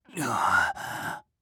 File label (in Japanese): ぬあぁ…